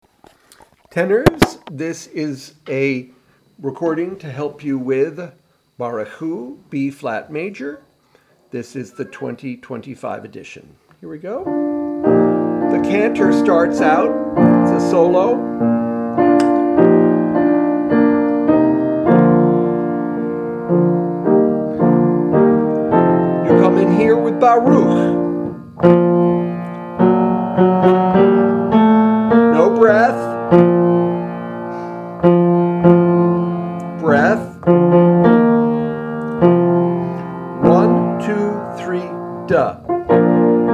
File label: B flat major tenor; Barchu